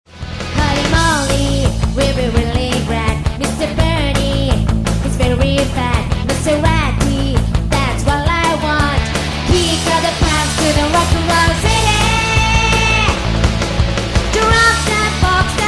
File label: music